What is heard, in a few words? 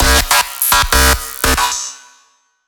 gate, bass